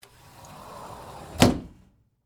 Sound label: Door Slide Open, Foley, Door, Slide, Open, Closet, Door